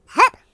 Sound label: Jump; hedgewars